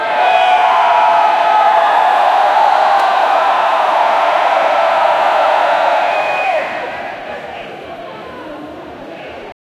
crowd yelling in a stadiu; arena

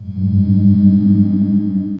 hollow ghostly moan

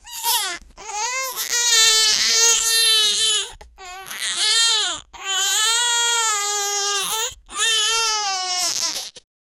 crying baby

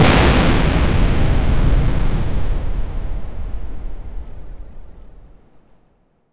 sharp crystalline explosi

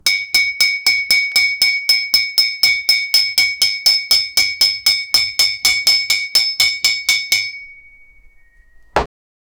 a blacksmith hammer strik; work

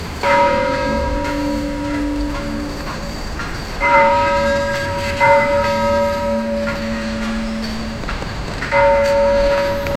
atmospheric 16th century; historical

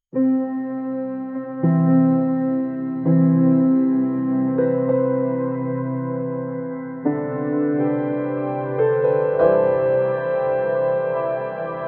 magical ambient neoclassi; enchanting

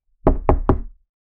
human body hitting wooden, falling

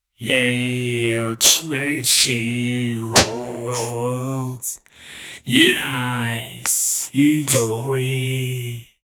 congratulations

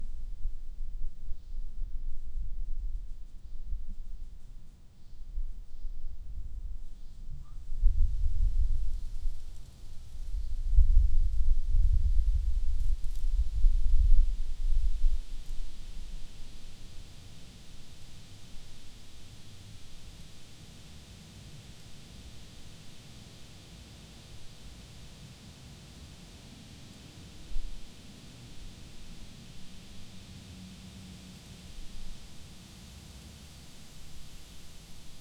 light wind in the park

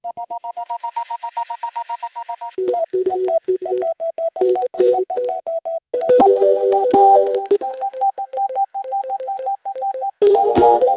dynamic track logo pop ji, jingles

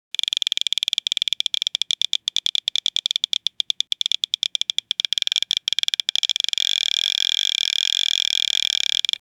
the creaking sound of an, fishing

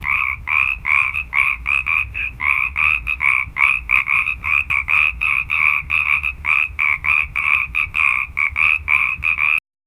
singing frog

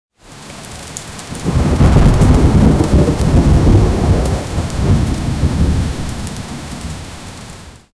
heavy rainstorm with rolling thunder

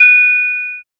feint ship bell

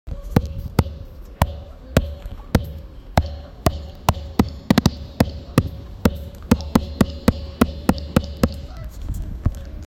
ball hitting bat in a, cricket

